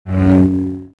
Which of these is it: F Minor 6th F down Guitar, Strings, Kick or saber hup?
saber hup